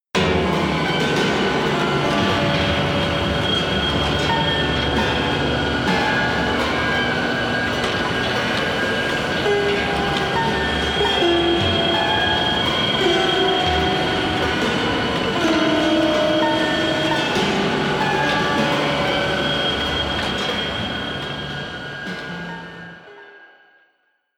broken piano chaos

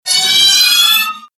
agressive motion

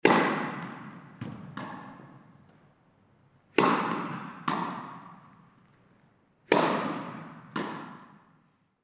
indoor tennis smash

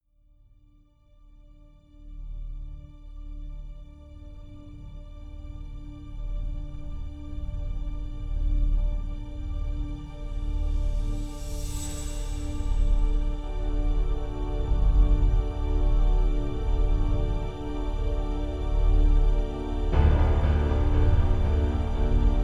sequence orchestral buildup

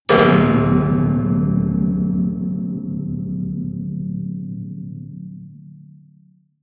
percussion

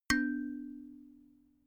notification kalimba third

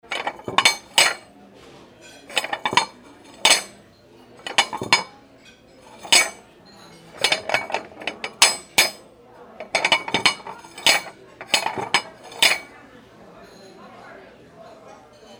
stacking plates restaurant

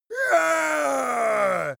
male victory deep breathy

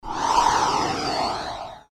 airy smooth phase

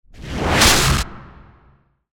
bad slap

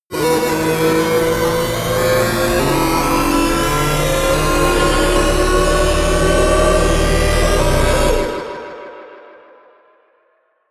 racing car synth

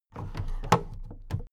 big plastic case put down

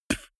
beatbox bass drum dull damped

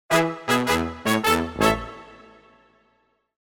funny wind section

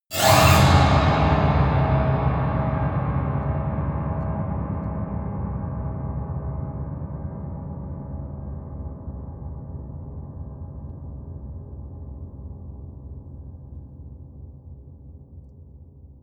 piano twang fast squeal long

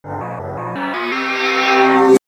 reverse random melodic

